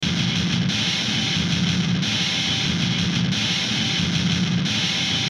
Musical Drum, Musical Drum Distorted Industrial Metal Loop; Drum Kit; Blastwave